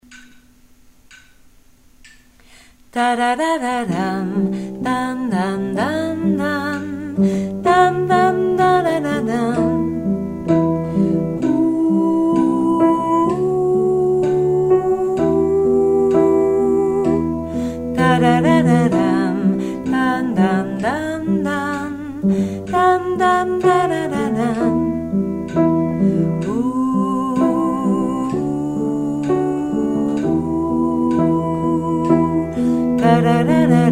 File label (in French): alto; c'est une chanson